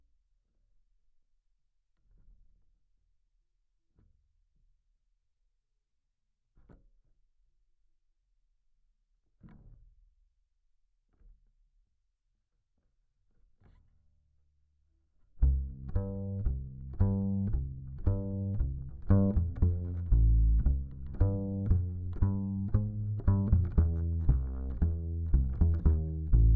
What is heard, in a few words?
Bass DI